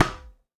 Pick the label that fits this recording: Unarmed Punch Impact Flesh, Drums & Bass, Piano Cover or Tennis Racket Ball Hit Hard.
Tennis Racket Ball Hit Hard